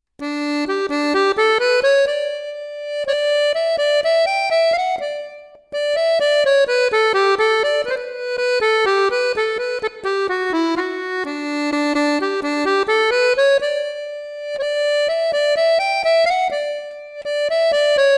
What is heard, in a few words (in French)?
Mazurka; Mazurka d'Augères; Basses Kervigen